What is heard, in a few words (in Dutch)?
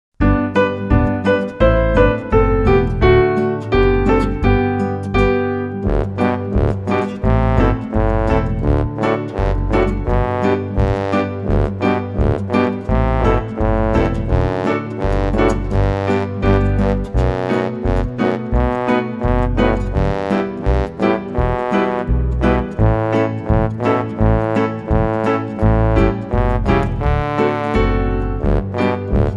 zonder zang